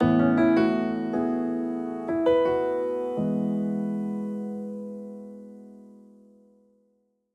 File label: logo